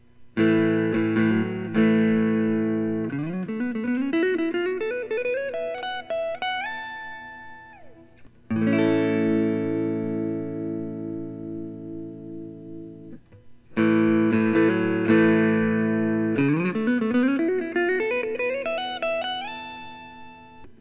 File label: fender telecaster thinline natural on ash